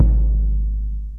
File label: BERIMBAU; OMI - Universe of Sounds